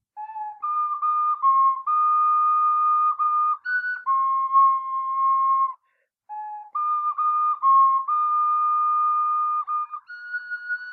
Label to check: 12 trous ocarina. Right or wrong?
Right